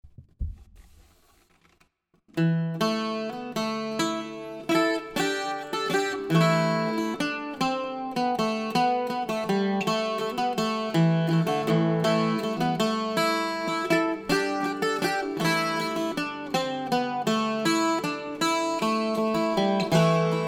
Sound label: bouzouki settingsun